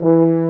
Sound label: F horn, Brass Wind; AKAI S6000 CD-ROM - Volume 1